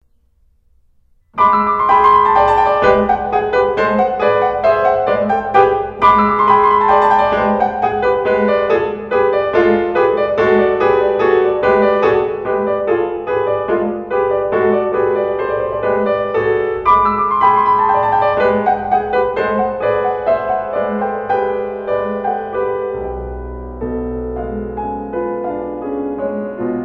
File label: piano; Oeuvres pour piano; Chiens; Germaine TAILLEFERRE